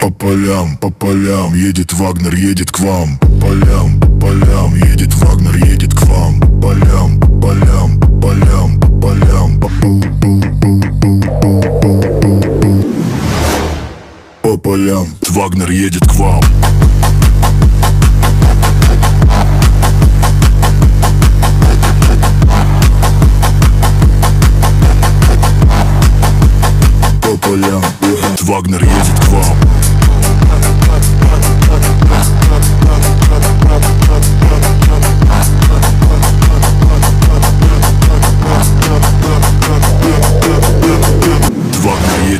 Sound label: Electronic Remix